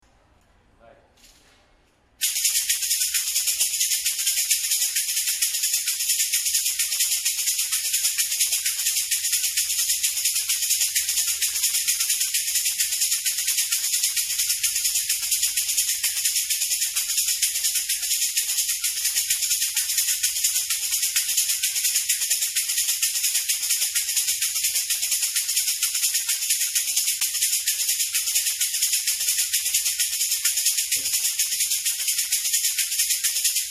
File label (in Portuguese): chocalho; ivsom